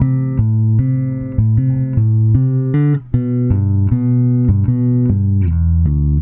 Bass, Indie Rock; Sonic Foundry (Sony Creative Software); Indie Rock Anthems; Session 12 - Spaced Out Knoll

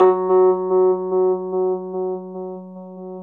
Chor Rhodes, F#; E-MU Producer Series Vol. 5 – 3-D Audio Collection; 3DRhodes; 3DChorRhodes1.5

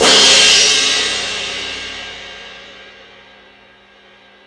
Crash, Drum Machines E-MU Pro-Cussion

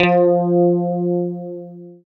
GUI, E-Guitar

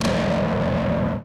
ALIEN VIBRA; ENVIRN-AMB

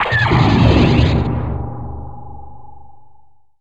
D+B

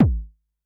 kick, Drum Machines, Clavia Nord-Drum; 300 Drum Machines

